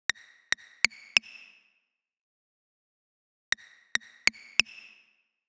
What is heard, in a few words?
Bpm140 Wind Perc Loop; Perc Loops